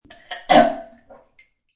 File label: Choking sound; Gagging (Sound Effects:Voice)